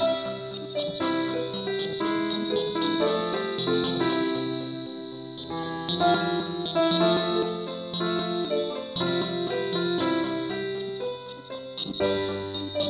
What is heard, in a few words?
keyboard only key c